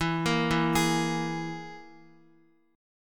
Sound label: E Major Flat 5th Guitar; arpeggiated; 4F,4A,5C,5G,5C,6E